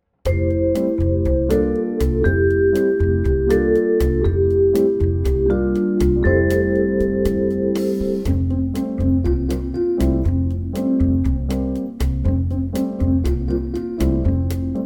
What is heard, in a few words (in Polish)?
swiateczny czas podklad z melodia; podklady nuty